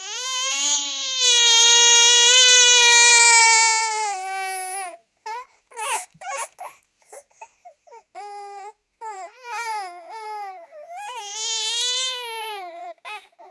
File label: a baby crying with a